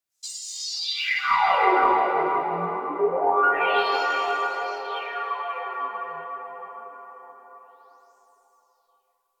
Rift One Off; Warframe; Magician

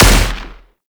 Shot; Warframe; Weapons; Archwing; Arch Anti Aircraft Gun; Kuva Grattler

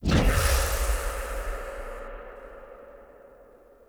Health Critical Death, Warning Stingers; Player; Envoy

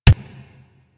Pistol Punch Initial, weapons; Warframe; Thanotech

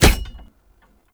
Rope Winch Reticle Hit; Puzzles

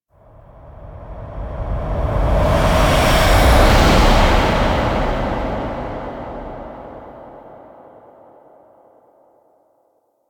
Throne Room Whoosh; Cinematics; Warframe; The New War; Sentient

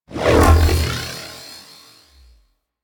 Eruption Enemy Lift; Warframe

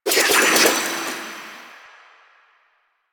Kunai Reload; Warframe; Weapons; Choir

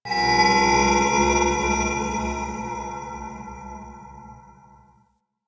Crp Ship Temple Moving Bells One D; Ambience; Warframe; Corpus Ship Remaster

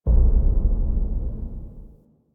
Transmission Low Boom; Duviri Quest; Thrax

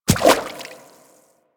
Inf Medium Run Water, Infested Footsteps; Warframe

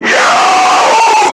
Screamer Death; Warframe; Lasrian

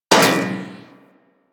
Bullet Impact Debris Pipe

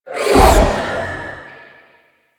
Wraith Teleport; Enemies; Warframe; Zariman

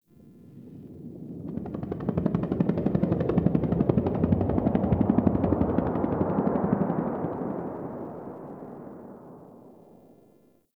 Distant Ambient Helicopter

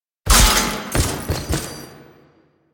Riposte 2H Nikana Finisher Foley; Enemies; Finishers; Dax; Warframe; Duviri

